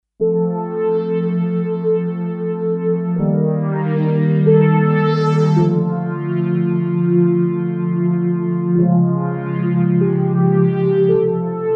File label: Kawai K5000S; factory presets; VoiceStr